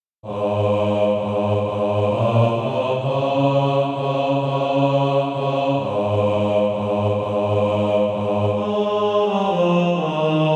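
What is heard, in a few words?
bass; Far and Near Clemm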